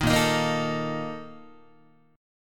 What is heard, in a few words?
Bb Major 7th Suspended 4th, Guitar